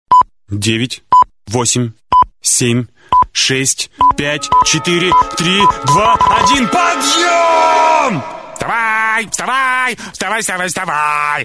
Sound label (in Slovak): Superbudilnik